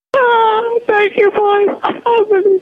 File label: cry; vettel